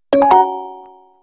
connected; NVDA; NVDA Ericson Sound Theme

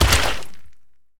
mud fall; Golden Crayon Sounds

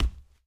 hardwood step; Golden Crayon Sounds

